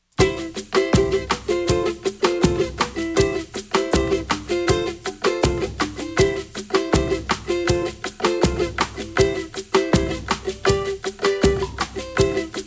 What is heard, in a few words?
Who Lives in a Tree Instrumental